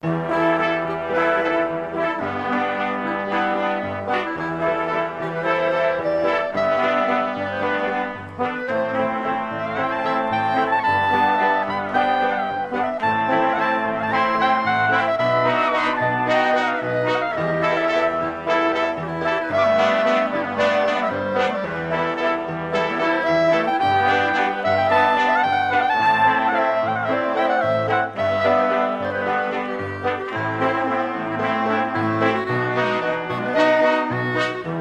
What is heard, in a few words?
Rocket Jump Waltz; team fortress 2; source filmmaker